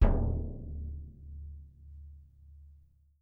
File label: BD P, Percussion; Miroslav Vitous - Percussion & Harp